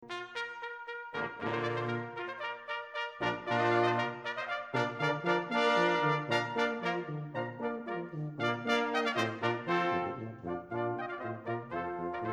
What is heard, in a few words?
Brass; Royal Fireworks; Handel